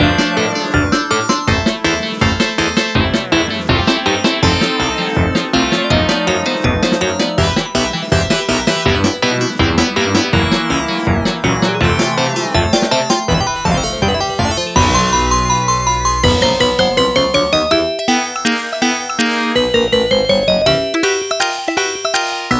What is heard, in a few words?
Miniboss Loop